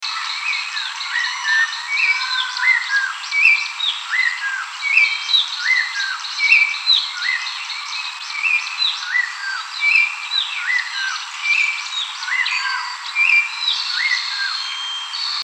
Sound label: red tailed palm thrush, Mbandaka